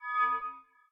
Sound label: ui okay